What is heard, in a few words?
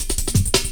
Drum Loops, Jungle; Zero-G Jungle Warfare 1, 2 & 3